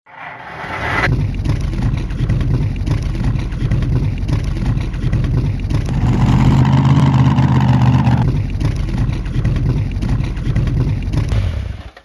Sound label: PT BOAT